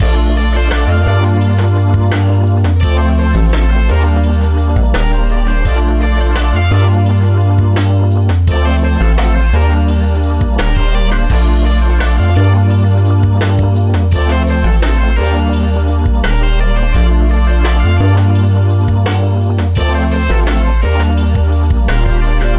Loop

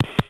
Radio Click

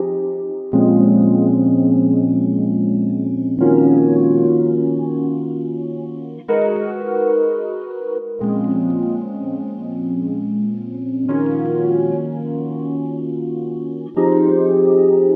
Rhodes

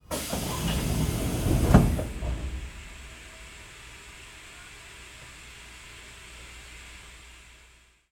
Old Train Door Closing

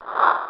Whoosh

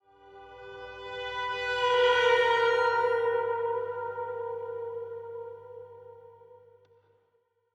Cinematic Violin Cosmic String Riser

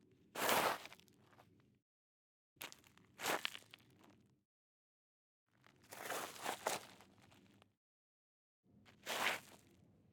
Grave Scrape

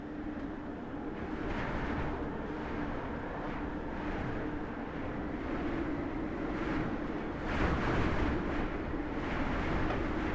Whistling Antarctic Blizzard

